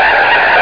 skid!; moviesetter; charley cat

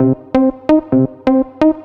Techno; e-LAB

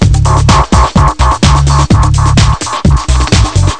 music; Interactive Media Design Review 1999

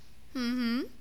hihi

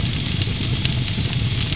fire loop; specops

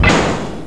Show Bonus; Barrack 1.0.0; Barrack Sounds

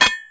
Small Metal Hits; 1944 Battle Of The Bulge

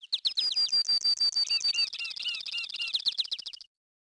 BIRDMIX, residential

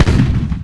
gun; INCOMING

